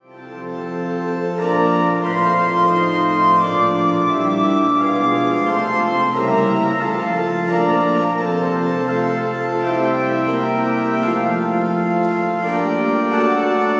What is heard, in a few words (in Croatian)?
ORGULJE